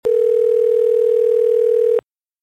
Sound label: ringing